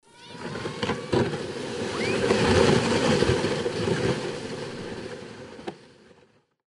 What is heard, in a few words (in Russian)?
Sanki s gory